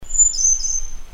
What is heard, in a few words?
Snowy browed Flycatcher, birdcall